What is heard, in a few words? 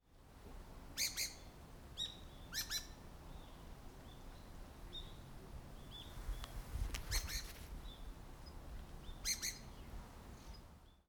tugumi call; ツグミ; 新潟県新潟市西蒲区仁箇; bird song; J-eco 鳥のさえずり図鑑